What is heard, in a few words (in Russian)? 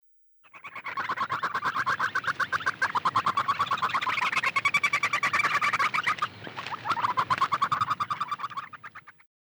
bespokojstvo samochki